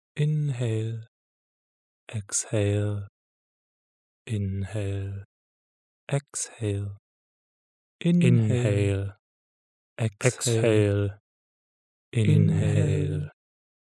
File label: inhalexhale